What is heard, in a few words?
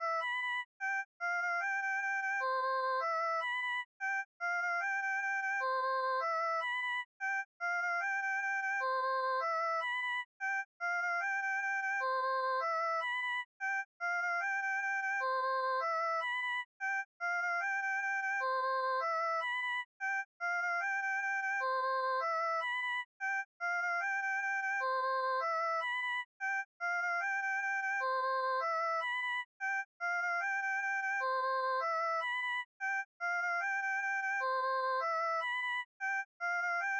annoying theme; YokaiSurvive